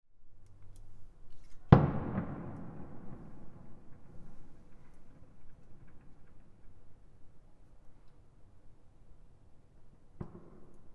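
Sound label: fire works, Fukushima University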